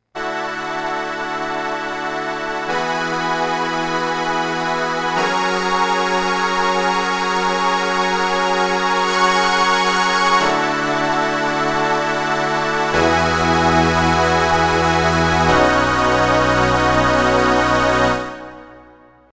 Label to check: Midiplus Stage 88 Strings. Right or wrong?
Right